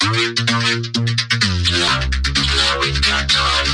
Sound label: 128 now we got time vocode beat; VOCAL OR VOXY LOOPS